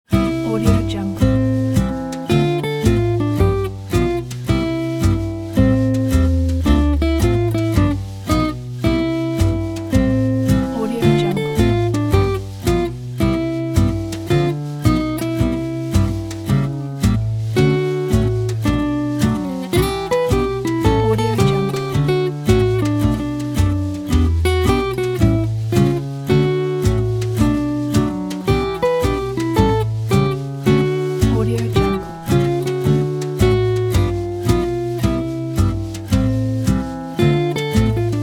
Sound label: easy gypsy jazz